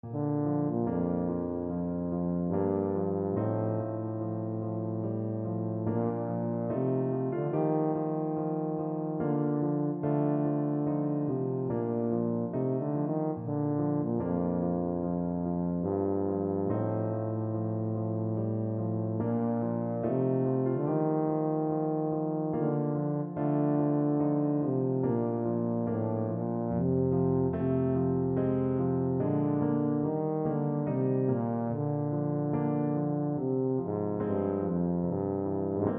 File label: raindrop, tuba